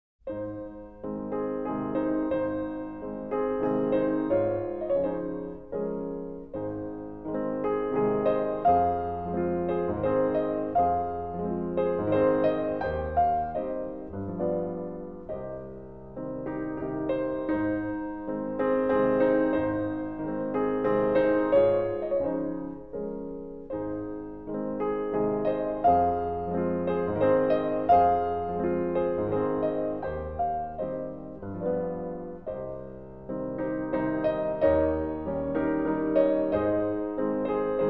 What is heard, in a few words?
Waltz in A flat major, Op.39, No.15; 왈츠 Op.39-15